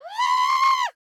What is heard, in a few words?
female scream